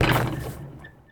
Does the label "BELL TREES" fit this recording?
No